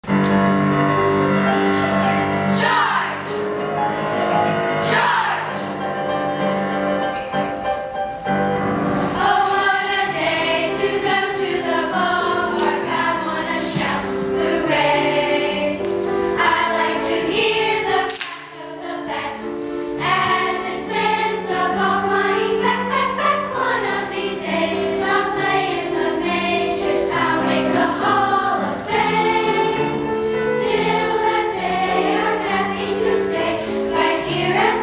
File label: Children's Choir; Tamers Music